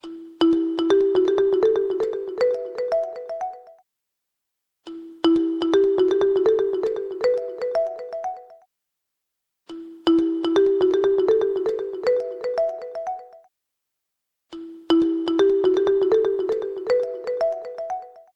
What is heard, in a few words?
Cyclical, ringtones; ZTE Axon-M